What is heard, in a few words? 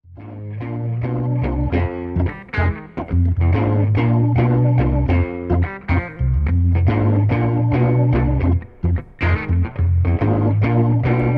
Up To Here w/o drums